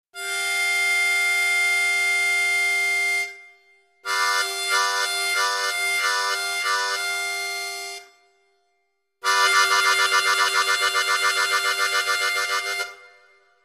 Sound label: Tongue, harmonica